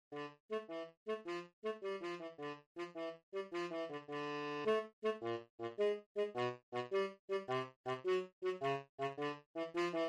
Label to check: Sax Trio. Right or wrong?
Right